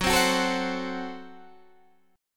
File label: Gb Diminished Guitar Open D